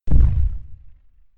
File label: step; backwards; demon